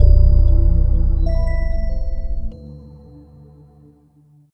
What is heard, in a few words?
Shutdown; Windows Never Released Soundpack 1